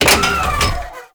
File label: Thumper Bot Leg Damaged; Warframe; Grineer